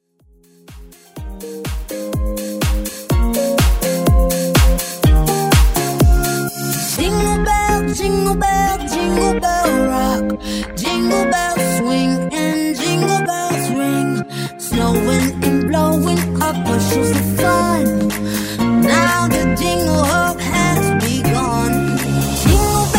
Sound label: Xmas MashUp